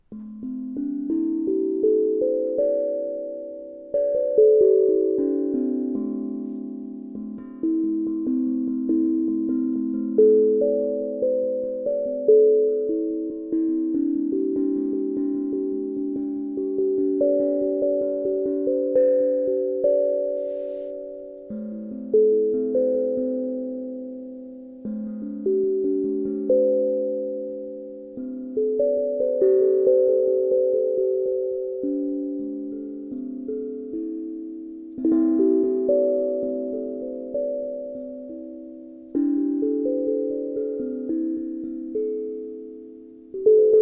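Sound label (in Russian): Ля минор пент A3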